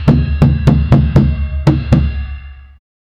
tambores cerimoniais de c, ceremony

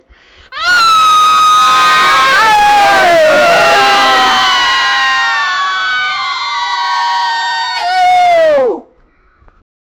um monte de fs a, cheering

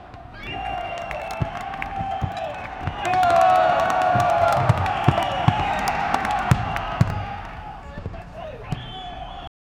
som de uma partida de, tennis

